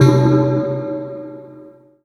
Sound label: FILM BELL C2; Best Service Dream Experience; SPECTRE